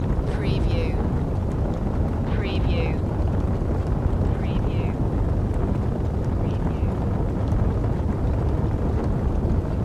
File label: Fire Flames Loop